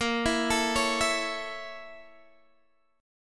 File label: Bb Minor Major 7th Flat 5th, Guitar, Open D